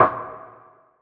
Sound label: perc organic crank